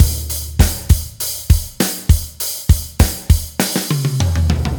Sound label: Crystal Drums